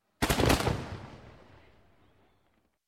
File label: Cannon Explosion; Sound Ideas 2000 Series